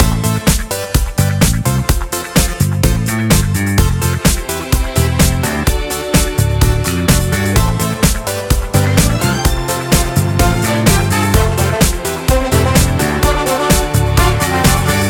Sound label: emote groove jam; fortnite